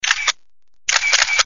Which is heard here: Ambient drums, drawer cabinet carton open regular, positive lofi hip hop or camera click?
camera click